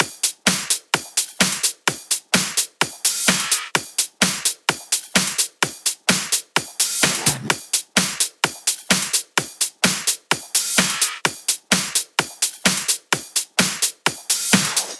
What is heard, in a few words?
Electro House Kit 1 Drums; Mutekki Media; Vengeance Electroshock Vol.2